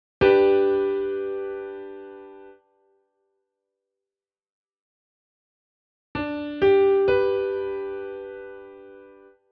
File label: akkord